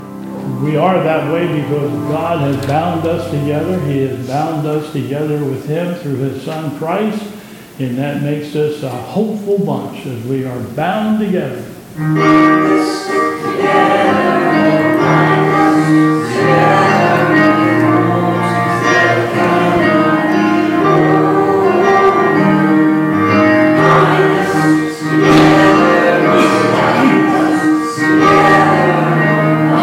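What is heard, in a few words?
Closing Chorus, church service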